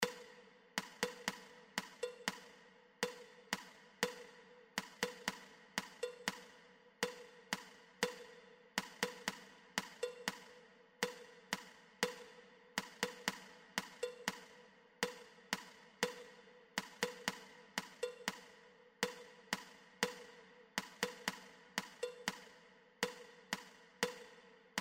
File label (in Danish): viol bossa rytme